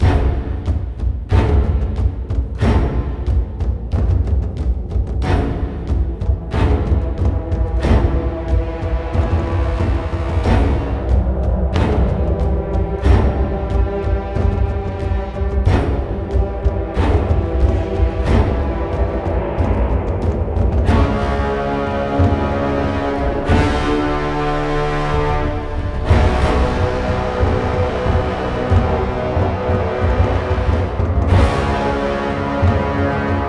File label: Bossfight Prelude Action; ryse son of rome 2014